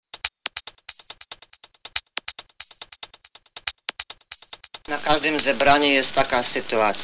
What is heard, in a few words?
Trance And Acid Hard Remix